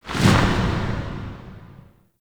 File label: Interior Orbital Station March; Warframe; Tau